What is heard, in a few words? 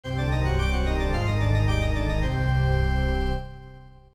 medium tasks complete; Morytania